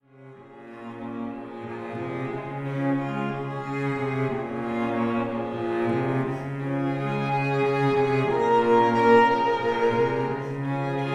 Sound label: String Quartet; Wedding Music; Ann Arbor, MI; White Pines Entertainment